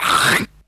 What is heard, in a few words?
spit hock